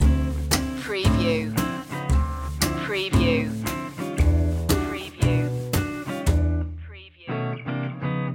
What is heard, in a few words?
comedy loop a